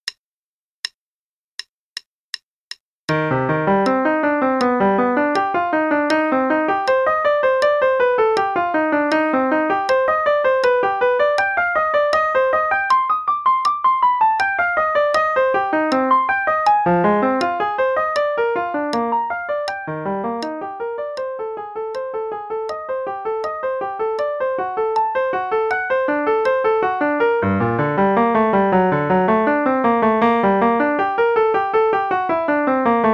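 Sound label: Play-a-long, quarter note=80; Bach Carl; Solfeggietto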